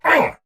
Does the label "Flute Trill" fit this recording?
No